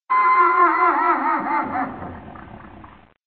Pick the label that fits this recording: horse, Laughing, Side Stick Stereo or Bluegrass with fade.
horse